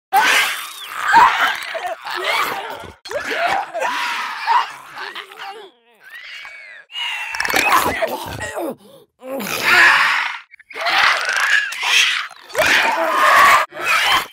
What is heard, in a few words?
rat king; The Last of Us Part II